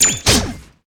Rouge Assassination; Inotia 3

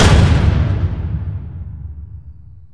Cinematic Impact

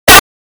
Walking; Gill

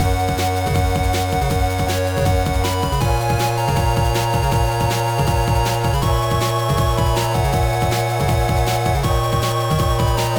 Air Vehicle; rpg tsukuru advance 2003 gba

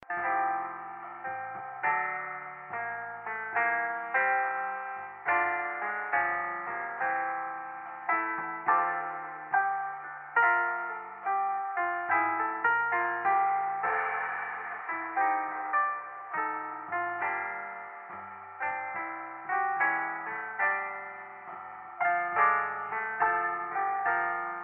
muffled; Fancy Is A Butterfly

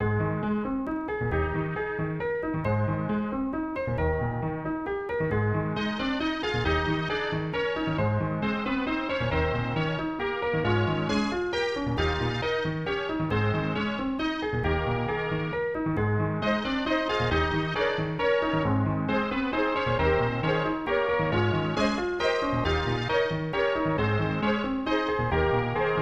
Waltz; Dai Kaiju Monogatari; SNES

